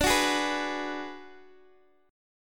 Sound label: D 6th Flat 5th D down Guitar Open G, chord